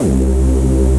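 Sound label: Rave Synth, Dance Mega Jungle Rave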